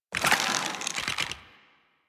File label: Draw, Weapons; Warframe; Infested; Inf Cernos